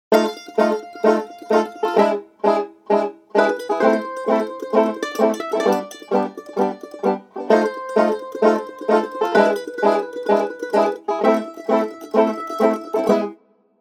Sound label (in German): Swing More Banjo und Mandoline